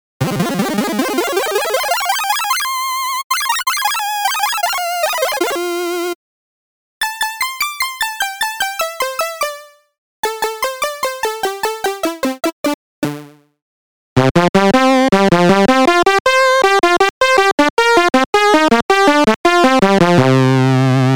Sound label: C64; 8bit